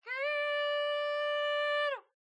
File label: Kulning